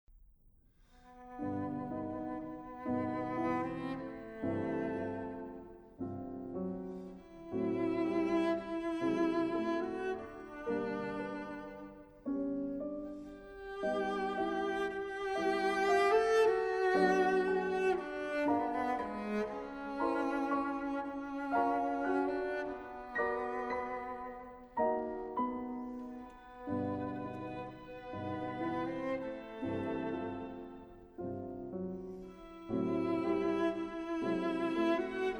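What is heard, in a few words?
piano trio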